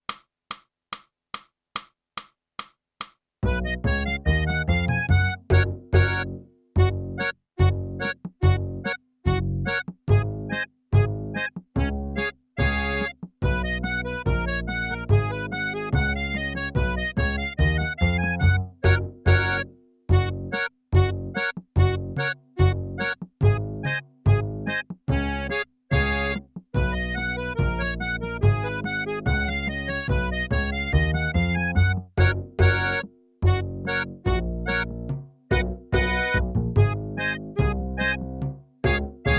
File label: sax, the college hornpipe